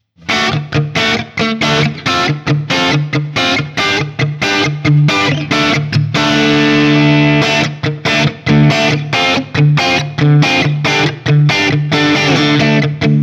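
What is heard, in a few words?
Guild T250 JCM800 D, guitar; Guild Tele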